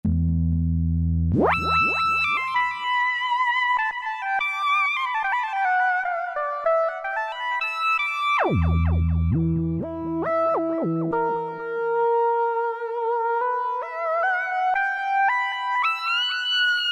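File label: Mellow Perc Lead; E-mu PK-6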